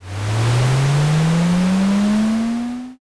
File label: airplane